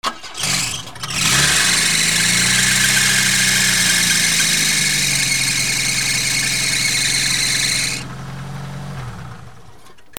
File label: CARS